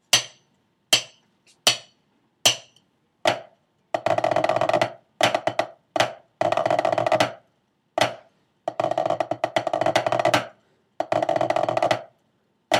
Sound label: snare tenor; my land